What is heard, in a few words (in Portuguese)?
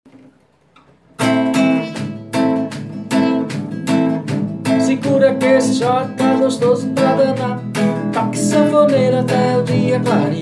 xote